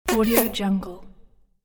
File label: comedy mouth fart; human sounds; درآوردن صدا با دهان